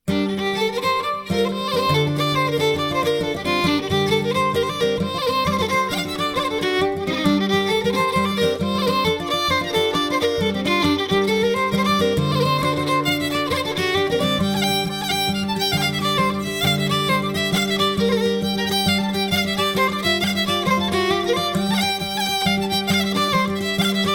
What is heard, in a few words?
connemara jig set, irish traditional dance music; fig 1 crabs